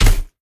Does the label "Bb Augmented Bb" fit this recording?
No